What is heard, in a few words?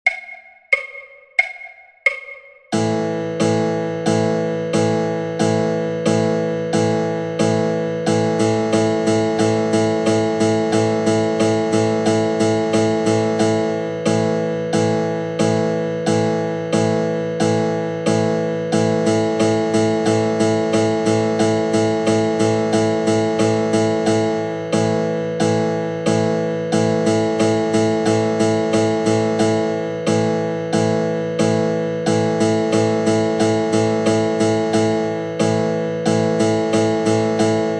Quarter till Eight Power Chord 90bpm, Guitar